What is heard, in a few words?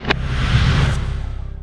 mask on; Battle; SkillSound; 낭인